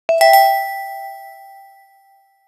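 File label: selection sound for button, casual game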